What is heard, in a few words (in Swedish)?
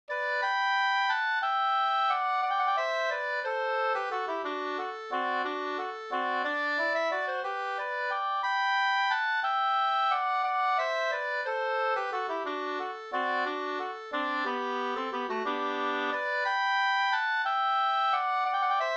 vals; Vals från Boda, Dalarna, upptecknad av Soling Anders; med andrastämma av Herbert Jernberg